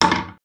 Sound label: wood misc, wood metal; wood metal SFX